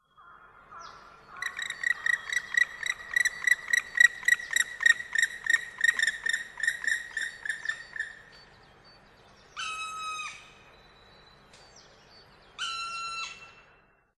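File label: Black Woodpecker; kumagera; hokkaidou; koe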